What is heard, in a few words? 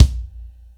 kit, Yamaha Motif-ES8